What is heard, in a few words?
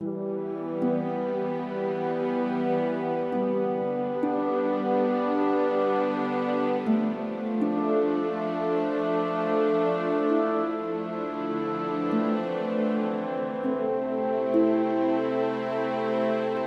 Drama cue Minor Slow